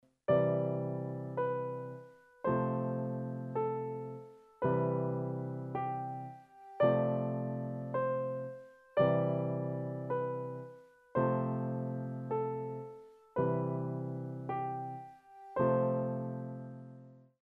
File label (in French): improvisation, piano